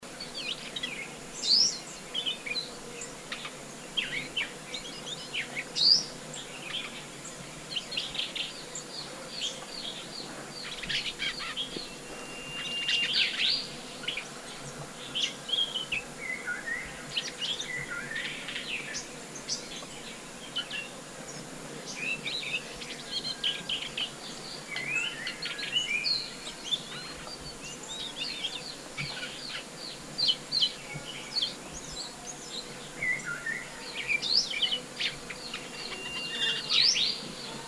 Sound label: Asian Brown Flycatcher Mute Song; gobemouche brun muscicapa dauurica; Guangxi Shanli